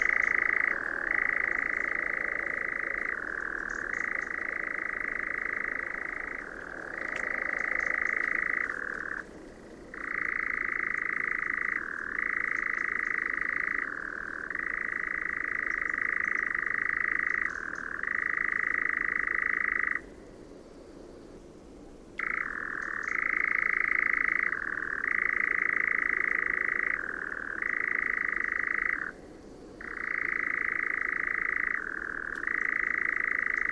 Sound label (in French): engoulevent d europe; chant